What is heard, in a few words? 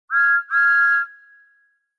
whistle clear